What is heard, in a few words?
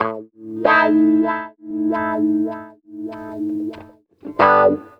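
guitar, Roots Funk; USB Soundscan vol.41; AKAI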